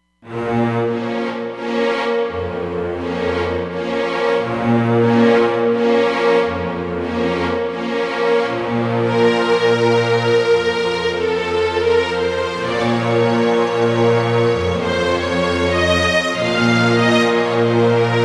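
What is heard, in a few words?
Hall Strings, Roland Fantom XR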